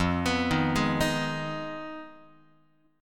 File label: F Minor 7th Flat 5th, Guitar; arpeggiated; JGuitar